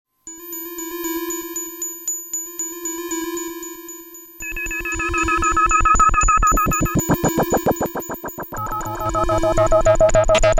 Wave Motion Music

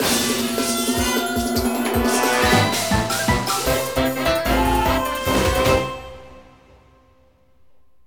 Level Clear

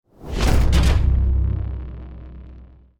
Lose screen; Drifting Lands

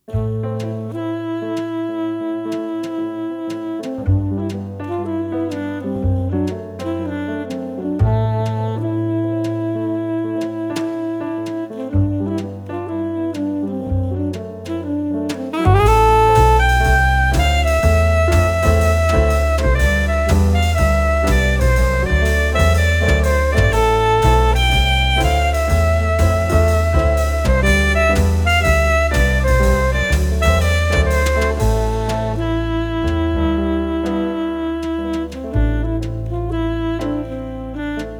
Spooky Jazz No Reverb

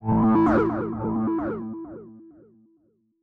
Chime; chime sharp

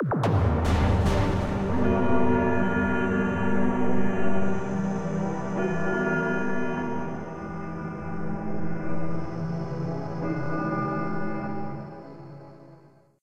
UFO Reveal

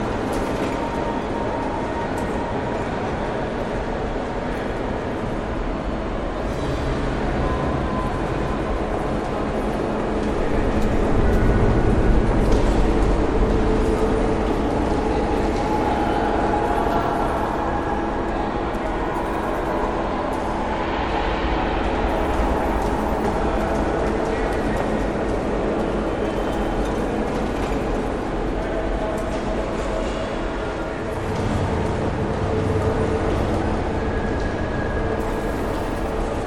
Fx Space station innen loop; darkstar one; windows xbox 360

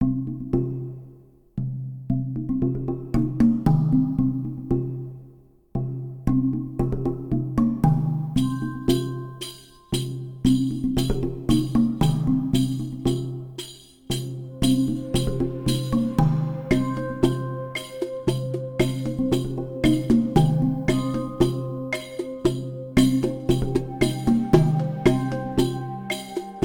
Course Forest Upbeat; Sports Story

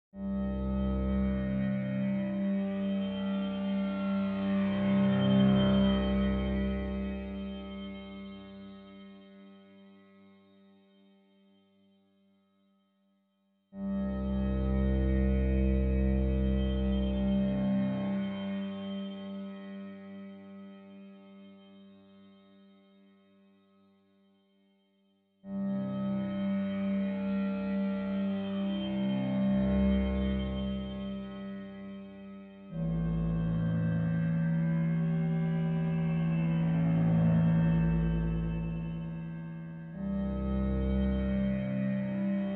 Ambient; King Lucas

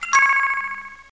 Correct Answer; Quiz Gakumon no Susume; arcade gamerip 1993

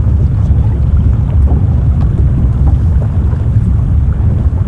Wave Underwater

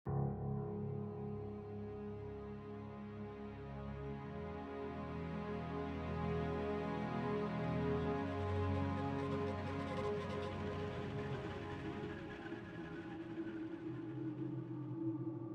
Female

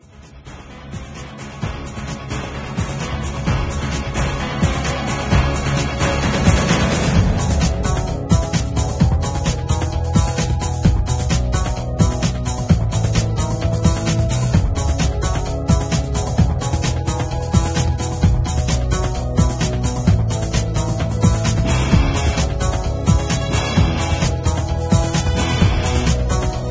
Menu Music

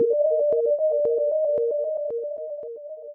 Sine Wave; gamerip; Splatoon 2; Dubble Bath

